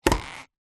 foley crutches on wood floor with handle squeak single